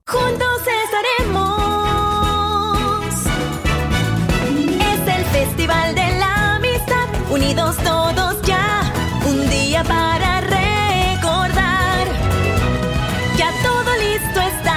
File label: Singing Spanish